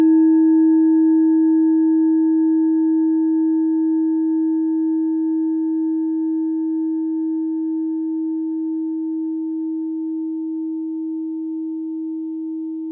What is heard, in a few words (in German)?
klangschale tibet